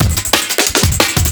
t180 comp, Processed breaks

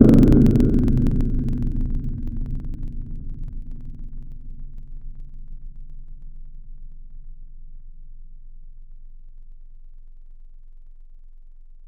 Noisey; Synthetic's Serum Collection; Echo Sound Works Sphere

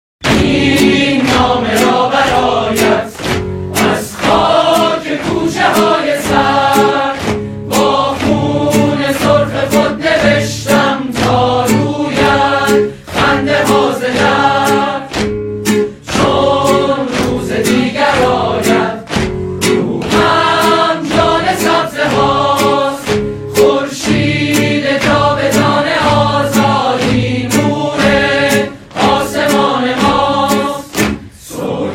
Ethnic Musicians

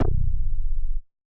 Bass Pike C0; Synthetic's Serum Collection

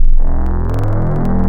Metalish; Digital; Synthetic's Serum Collection; Echo Sound Works Core Tables

